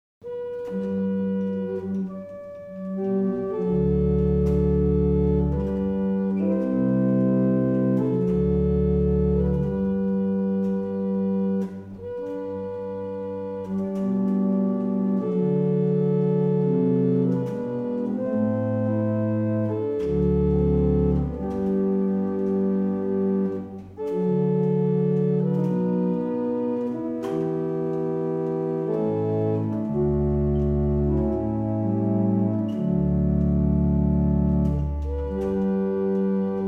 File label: German Hymn, barrel organs; Witton